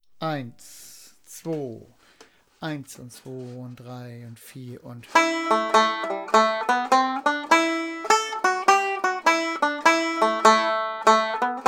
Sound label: Congress Reel, The Banjo